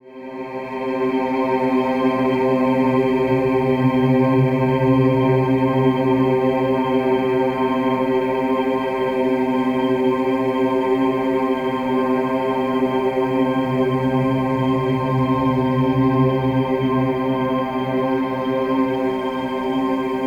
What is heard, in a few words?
FLOW SOUNDSCAPE C